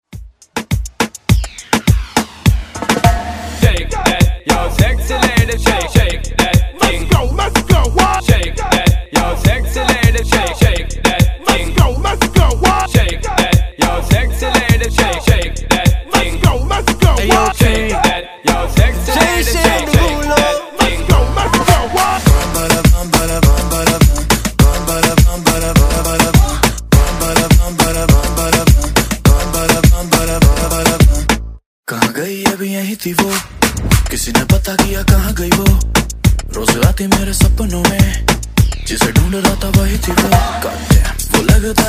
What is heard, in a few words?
Moombahton Hype Redrum